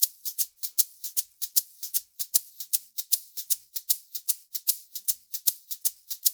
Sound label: LOOP, SHAKERS